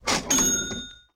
Money; LOOPERS

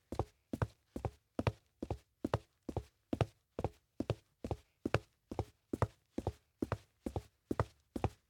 Footsteps Boots; LOOPERS

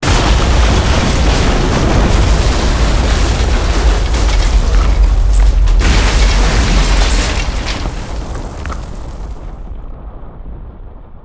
Roaring Sound; LOOPERS

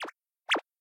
Bounce Around; LOOPERS

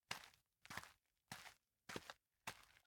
Footsteps; LOOPERS; Tyler

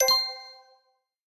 Inspire; LOOPERS

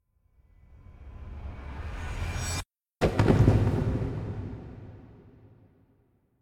Fall Down; LOOPERS

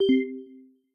system cancel; LOOPERS

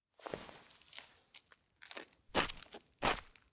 Stand Up; LOOPERS

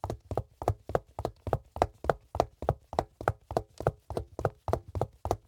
Footsteps Pumps; LOOPERS

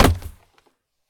Hit The Ground; LOOPERS

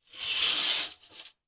Open A Curtain; LOOPERS

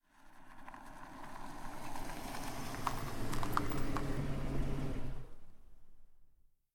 TAXI; LOOPERS

